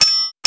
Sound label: SWHIT, SWORDHIT; Total Control - Action Cutz